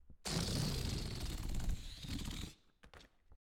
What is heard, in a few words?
Balloon Deflating